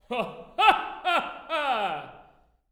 LAUGH, OP. LAUGHS; Spectrasonics; Symphony of Voices

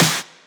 Snares; Vengeance - Essential Clubsounds Vol.4

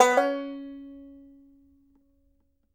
BANJO NOTES, DB4; Sampleheads; Whole Lotta Country 1 & 2

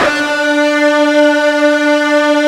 FUZZ D 3, Guitar; ULT FZZ LOOP; East West; Steve Stevens Guitar Samples Collection

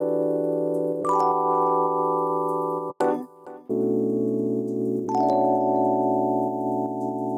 Epian Loop 130; Equipped Music; Loungin House

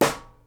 SNARE, Live Snares

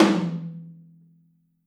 Drums Percussion, Kit1 Room Mic

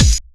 Bassdrum

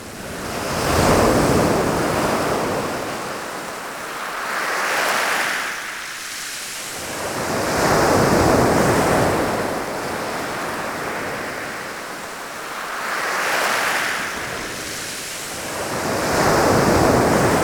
water surf rocks

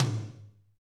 TOM, KIT Real-Kit; Real-Kit s12

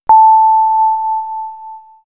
Swirls Bell, pads; X-Static Goldmine

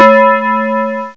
SYNBELL; BELLS; M8; CMI Factory

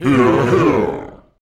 VOICE FX, Dance Vocals

